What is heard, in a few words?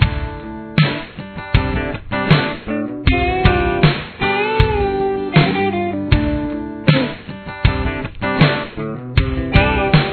solo chorus, guitar